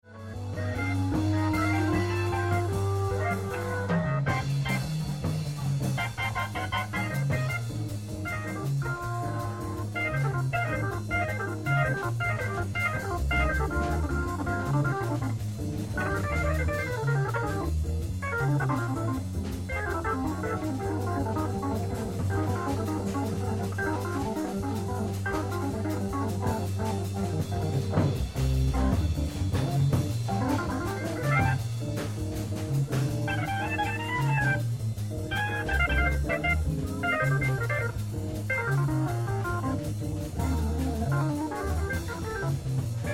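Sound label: Improvisation, orgues Hammond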